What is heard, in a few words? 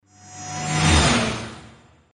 Overtaking Sound; OvertakingLegend